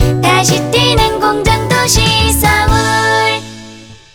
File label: Jingle; 2021 Seoul Vision 2030